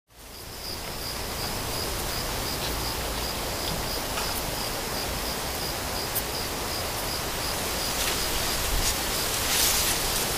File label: Chirping Crickets; psp; lunar silver star harmony